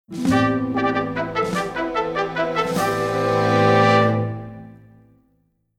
Fanfare!; shrek

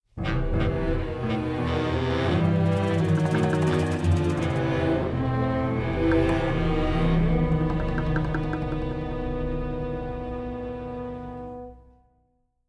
Accompaniment

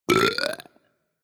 Burp; shrek

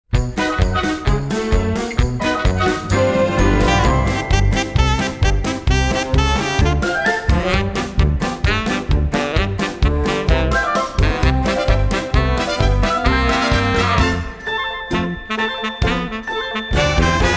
Exit Music; dare to dream jr

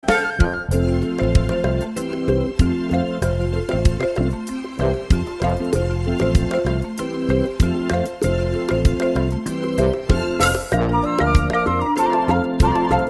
Vocal Audition Without Voice

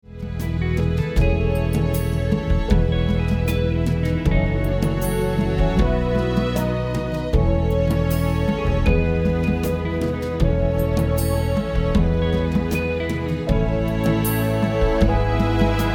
Accompaniment; Older Nala

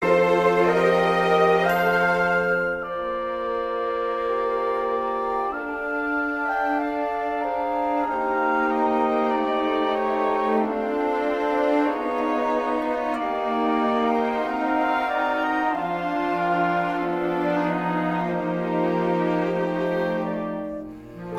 Vocal Audition No Voices

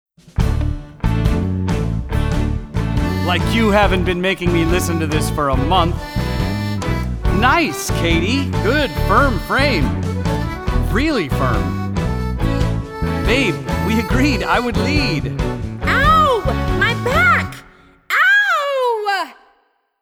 Wedding Tango Rehearsal; freaky friday

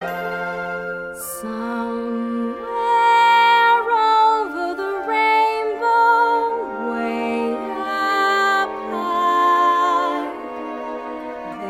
Vocal Audition With Voice

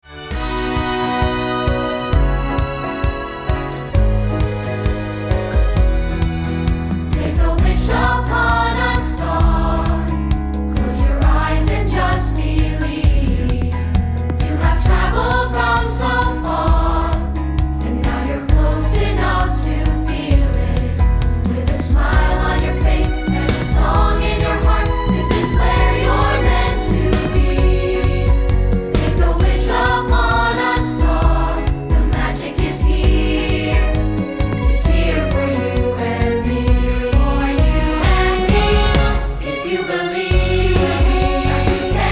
Audition Vocals; freaky friday

